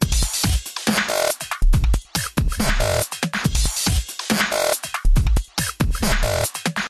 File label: musical drum loop electronic 140bpm; Audio Mangler